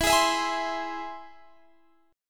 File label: E Augmented, Guitar, Standard; E+ strummed; JGuitar